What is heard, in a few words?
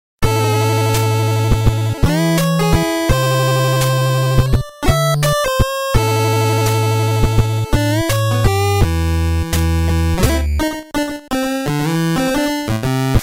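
8bit; Better Call Saul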